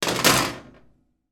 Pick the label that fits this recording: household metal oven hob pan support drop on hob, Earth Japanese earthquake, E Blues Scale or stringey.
household metal oven hob pan support drop on hob